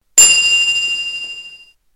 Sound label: Sms Ding